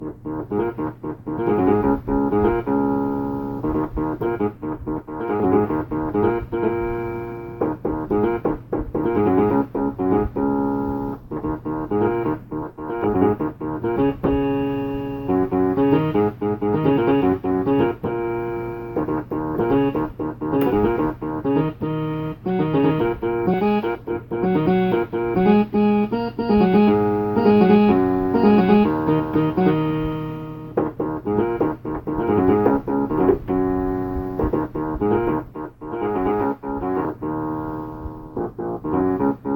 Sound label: piano; improv: E.Piano